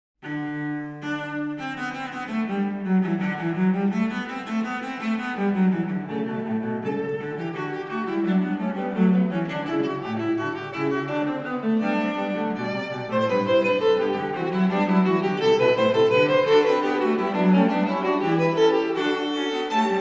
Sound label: Fugue in G Major; Ciurlionis